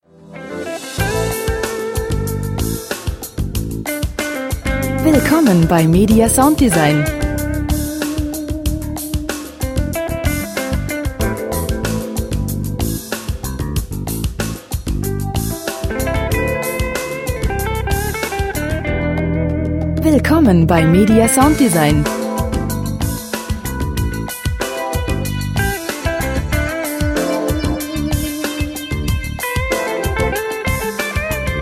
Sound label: rock unplugged